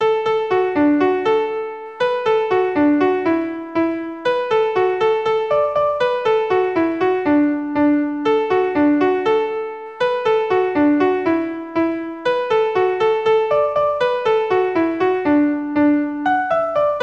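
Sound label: Polka D maj, Traditional Irish Music; Maurice O Keefes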